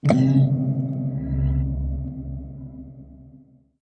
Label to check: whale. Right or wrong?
Right